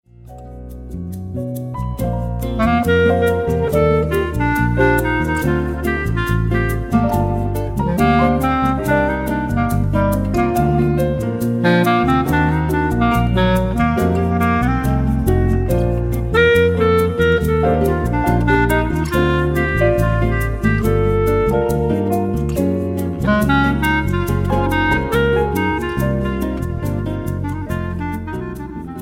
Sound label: party jazz